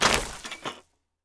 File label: ui unlocks unlock small wooden loot no tones; esmteam